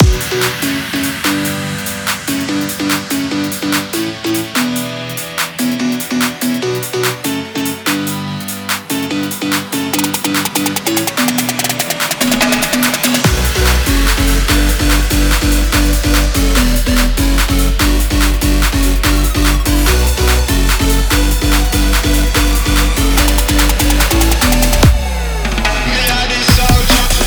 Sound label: Waves